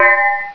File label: Ding!